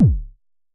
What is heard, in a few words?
Unce Unce Kick C#1, Vermona Kick Lancet; LookIMadeAThing Kicks